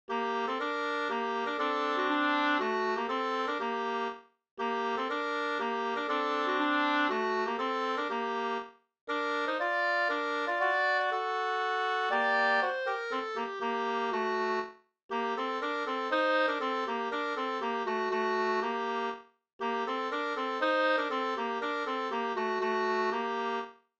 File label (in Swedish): polska